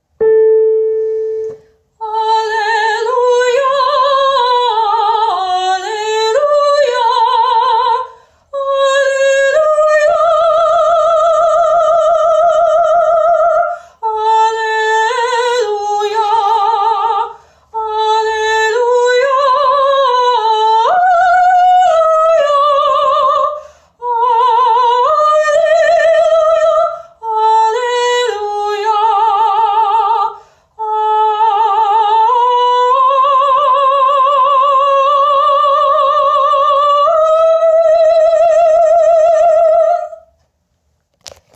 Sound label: alleluia soprano lower